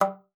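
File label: G3, bass flute; Xsample VOL-2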